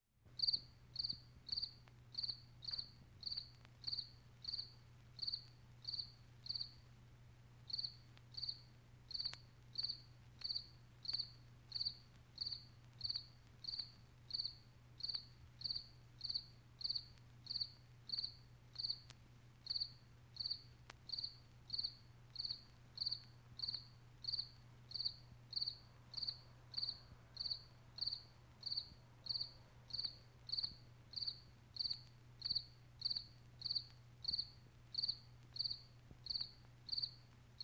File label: Single Cricket chirping softly